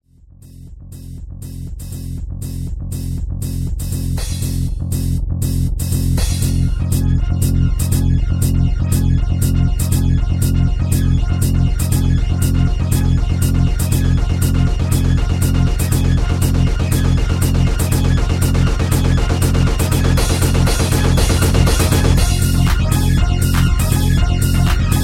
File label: Trance